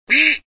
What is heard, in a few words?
Launch Duck; Angry Duck Cannon 1.5